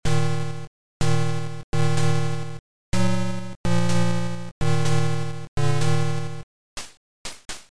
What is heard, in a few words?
tears game over; Russian time